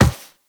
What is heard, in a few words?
Operator Walk Metal, Footsteps; Warframe